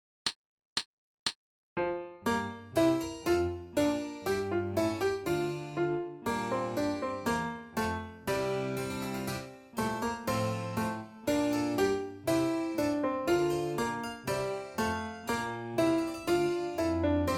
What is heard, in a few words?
Bourree Full Tempo with Solo, F Horn; Handel